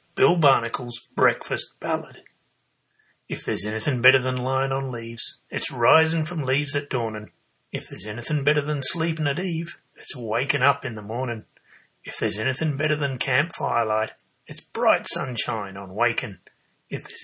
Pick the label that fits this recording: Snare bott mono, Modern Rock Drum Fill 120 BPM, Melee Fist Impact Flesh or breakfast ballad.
breakfast ballad